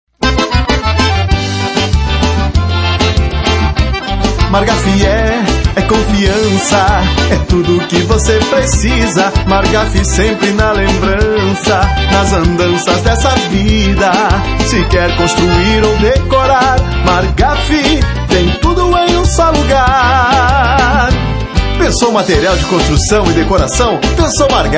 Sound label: jingle